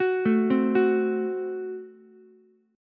F sharp Diminished F sharp, Ukulele; arpeggiated; 11,9,8,9

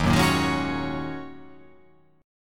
D sharp Minor 11th D sharp, chord

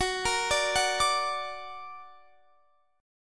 Gb Augmented, down, Guitar, Drop D

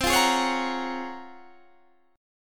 C sharp Major 13th, chord

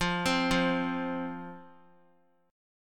F 5th F, down, chord; F5 arpeggiated

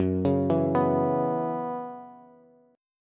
F sharp 7th Suspended 4th Bass, chord; F#7sus4 arpeggiated

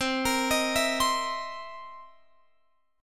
C 7th Sharp 9th C Guitar Open F; C7#9 arpeggiated

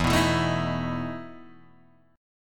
E Major 7th Suspended 4th, Guitar, Open G

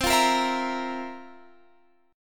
F Major 7th Suspended 4th C

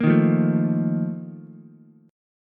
A sharp Major 7th Suspended 4th, Ukulele; strummed

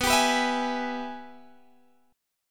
Ab Minor Major 7th Sharp 5th

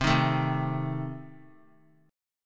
C Minor 6th, chord; Cm6 strummed; JGuitar

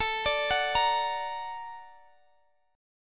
A sharp Augmented Major 7th A, guitar

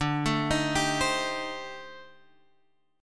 A-Minor 7th Flat 5th D, chord; Am7b5/D arpeggiated; JGuitar

